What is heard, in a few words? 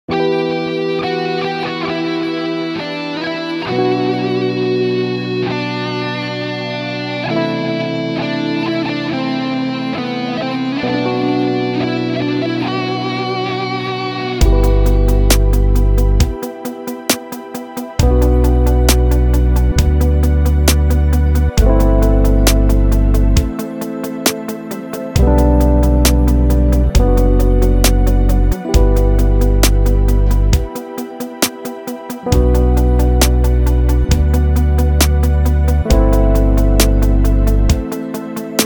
Pop